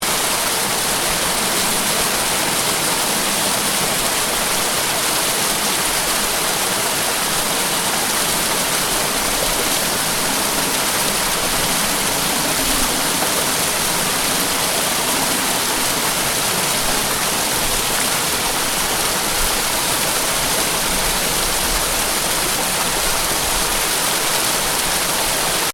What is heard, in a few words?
Waterfall loop, Waterfall, Medium Size, Continuous Running Water; Nature; Water Sound Effects; Water (Sound Effects:Nature); Stuart Duffield